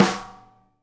SNARE, Live Snares

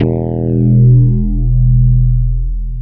Arp Bass C, One Shot Bass

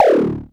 tom; Klone Dual Percussion Synthesiser

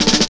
SN BUZ L; East West - Phat & Phunky CD2; 90's Sample CDs

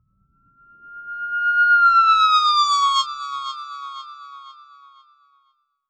Filter Proc, alarm siren samples, 120bpm; musicradar

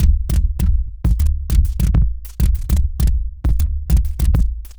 Dense Gravity Groove, Beats; Sonic Foundry (Sony Creative Software); Ma Ja Le Ethereal Textures

